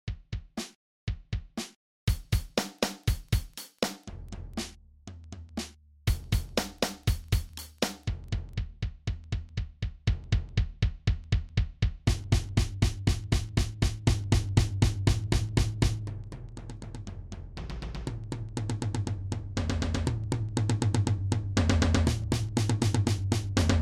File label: drumset fun